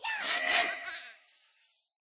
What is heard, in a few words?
charge, vex; Minecraft